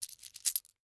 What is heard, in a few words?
Coins Moving In Hand; Foley